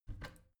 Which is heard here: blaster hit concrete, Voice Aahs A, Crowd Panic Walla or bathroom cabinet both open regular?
bathroom cabinet both open regular